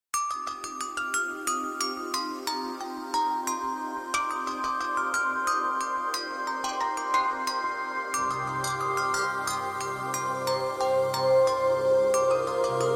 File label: Sweet Chime